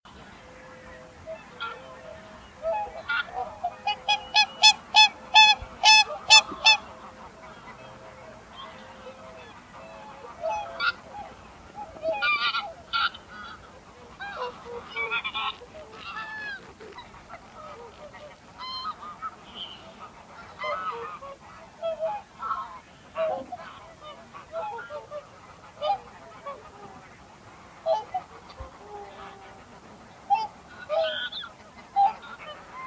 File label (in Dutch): wilde zwaan roep